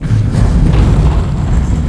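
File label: bridge start; Star Wars Obi-Wan; XBOX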